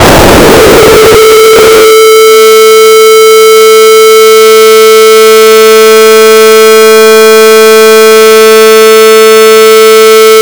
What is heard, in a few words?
noise